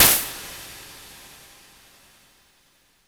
Matchstick

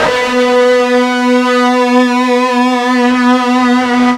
FUZZ B 2, ULTRA FUZZ, Guitar; East West; Steve Stevens Guitar Samples Collection

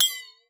WATER, PERC; Xsample VOL-9

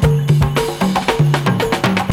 ETHNO LOOP, BREAKBEATS

